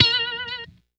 Pluck It Bb, Guitars; Drum & Bass; Total Drum&Bass

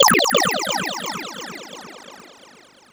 Sci fi

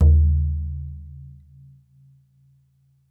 BODHRAN

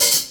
HH, HIHAT HIP; Best Service Dance Mega Drums

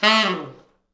T Sax falls, Horns Sax

